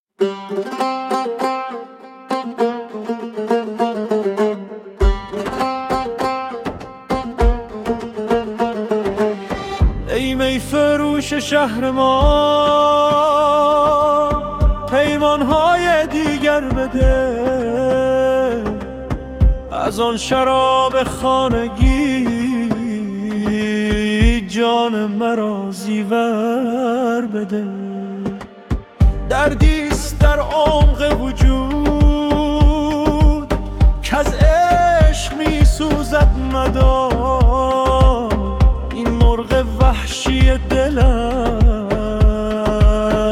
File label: Mystical Tar solo with a soft electroni; تکنوازی ساز ایرانی